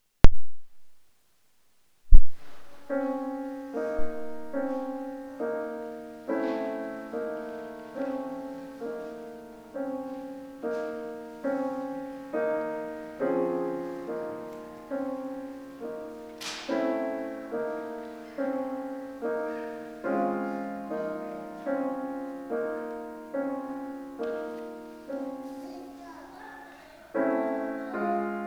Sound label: piano; instrumental chamber works